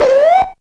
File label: TOON; Zero G; Man Machine